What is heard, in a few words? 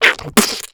Splat